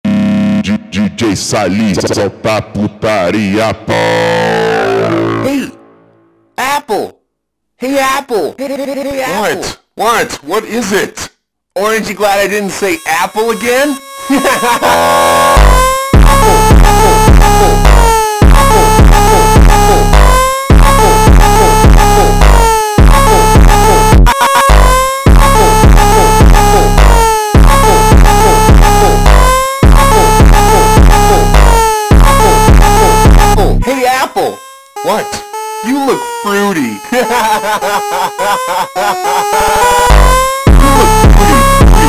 Funk slowed

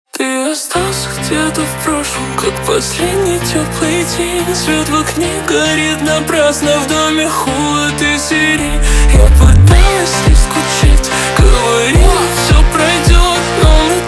Russian funk super slowed reverb

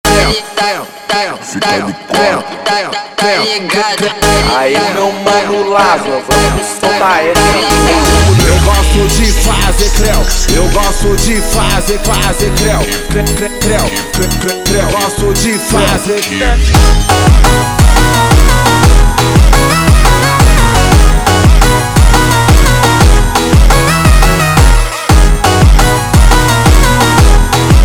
Funk slowed

